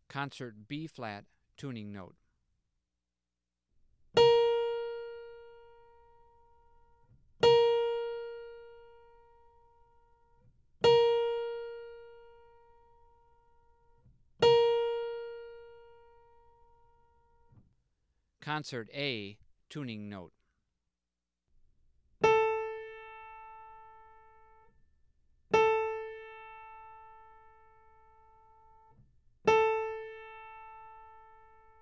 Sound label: Tuning Notes; James „Jamey“ Aebersold Play-Alongs; Jazz Bossa Novas